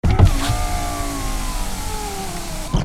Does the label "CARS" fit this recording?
Yes